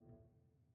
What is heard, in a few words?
piano harmonics A2, Grand Piano